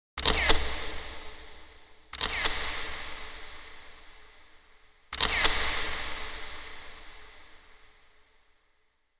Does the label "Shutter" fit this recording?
Yes